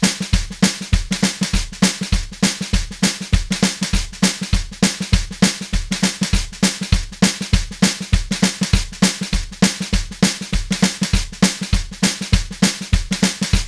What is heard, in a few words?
Train Shuffle, 100, Drums